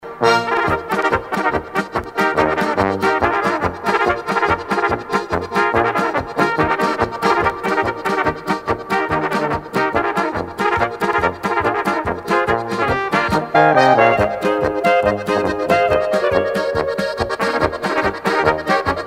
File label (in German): Trompetenzauber